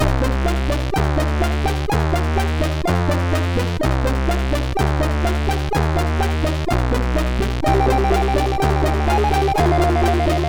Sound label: Woods, MOS6581R3